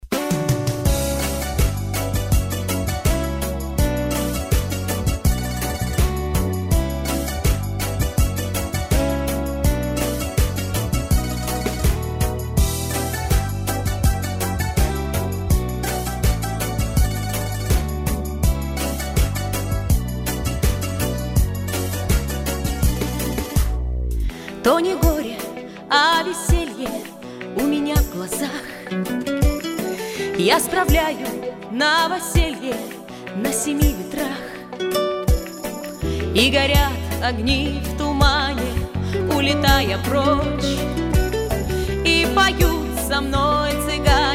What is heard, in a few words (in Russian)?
Gitara